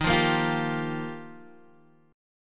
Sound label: F 7th Suspended 2nd Suspended 4th Eb